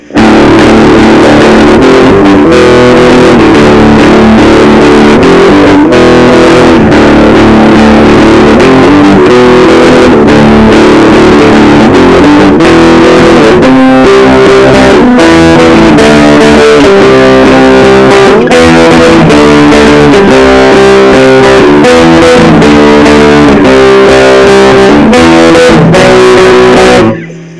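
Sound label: Star Original, Big Muff Fuzz; guitar riffs; Stoner Rock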